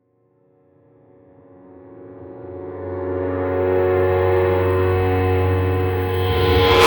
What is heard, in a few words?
whispery textures layered, reversed piano